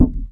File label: plastic barrel impact soft; physics